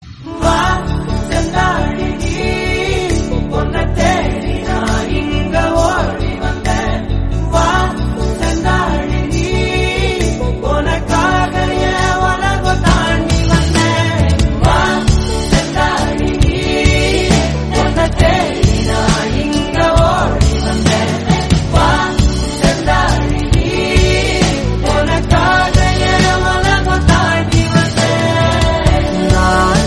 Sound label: Song Ringtone